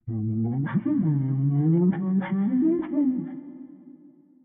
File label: Stalker